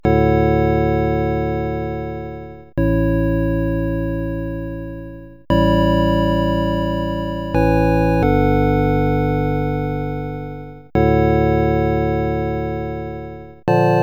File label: offbeat